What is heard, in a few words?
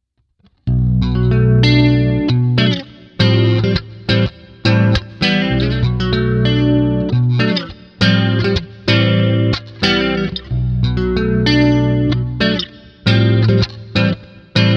Reverend Descent W Bridge Neck Humbucker Clean Jazz Chorus